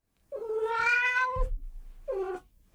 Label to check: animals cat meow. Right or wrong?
Right